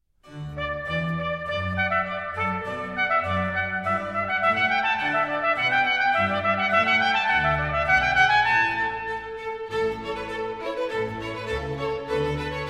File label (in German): Allegro aus dem Trompetenkonzert D-Dur